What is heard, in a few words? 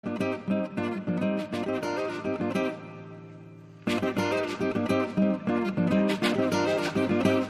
double alert